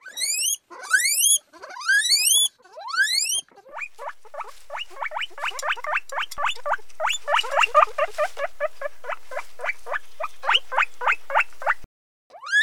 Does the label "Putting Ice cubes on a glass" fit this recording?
No